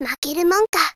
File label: Pinch Voice